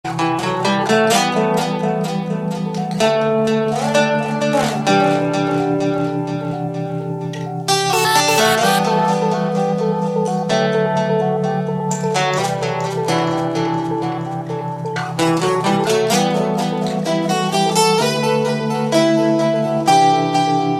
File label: trance ringtone